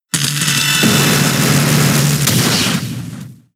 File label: Charge Beam; pokemon sfx gen 6 attack moves xy oras